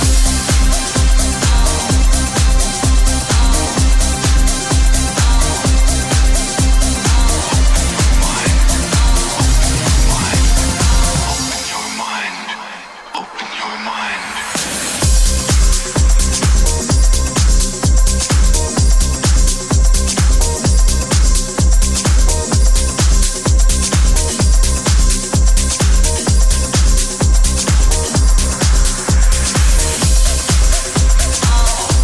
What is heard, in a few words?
128bpm Cm